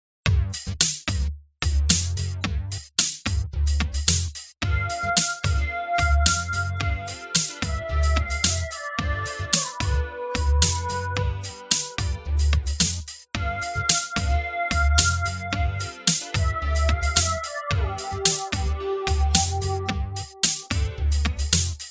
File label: jazz